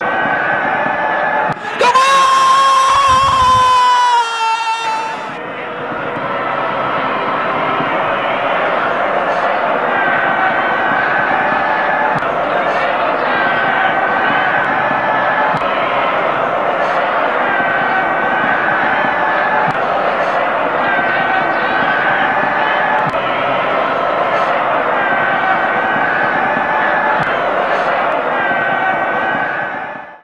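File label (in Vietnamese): Âm thanh không vào, đá bóng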